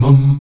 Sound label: VOX MUM C#3D, Afro Chants, Stacc Mums; Roland LCDP11 Africa VOL-1